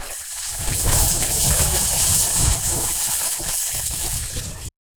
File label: rain